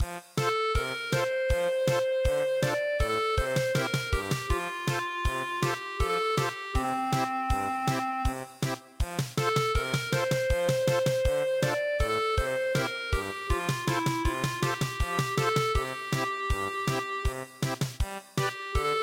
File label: Serenade German Polka; midi; traditional